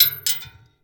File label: Shell 39mm metal; STALKER; IWP